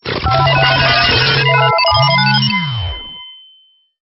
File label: Stinger; STINGERS